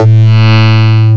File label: synth, ANALOG; CMI Sound Library Rev 1.3